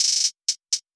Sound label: Hihat Roll, 124 BPM; Drum Accents; CrashOut